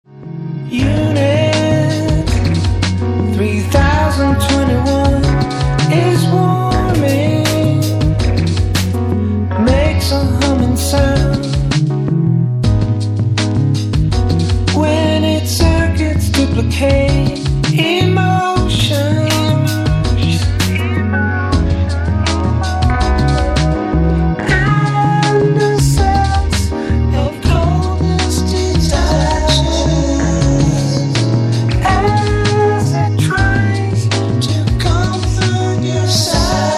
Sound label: robot